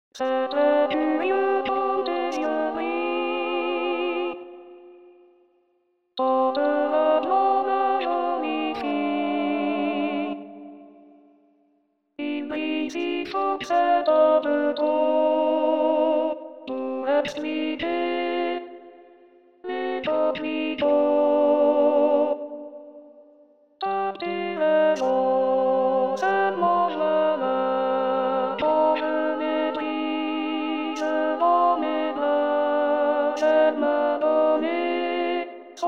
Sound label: coquelicot Alto